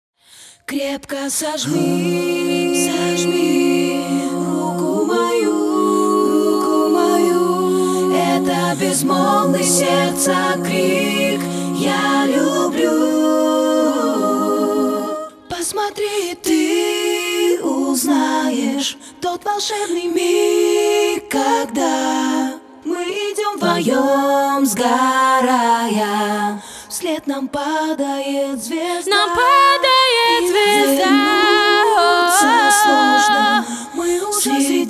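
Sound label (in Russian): Бек-вокал Акапелла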